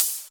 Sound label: Ride, Cymbals; Vengeance Samples